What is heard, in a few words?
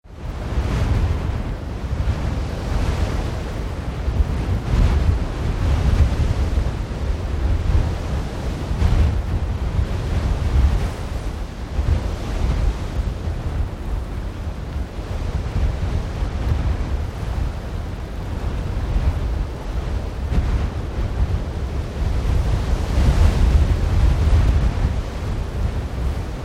Wind Open and Blustery; with some Foliage moving - Some big gusts; Tom Hutchings